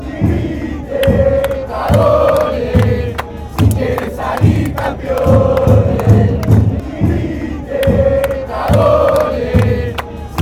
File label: chant, fanchants; deportivo merlo